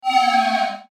Mouseover Swell Synth Reverb; Multimedia; Blastwave

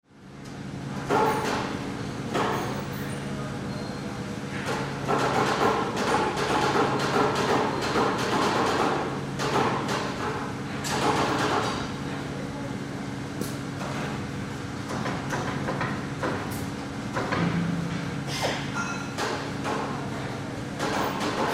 Machine Shop Grind Saw People In Background; Ambience Factory; Blastwave

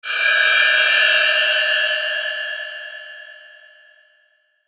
Shimmer Pulse Low, Low Vibrato Swell; Deep Tone; Blastwave

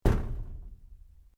PLASTIC PIPE LARGE STRIKE; Material components for constructing more complex sounds

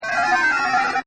Game SFX, Arcades; Partners In Rhyme

